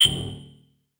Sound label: Transition Hit Impact Ping